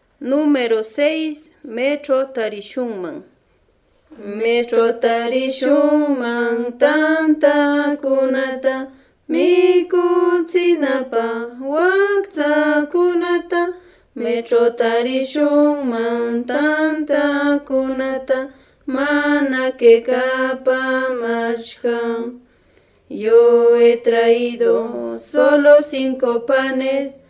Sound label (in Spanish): cancion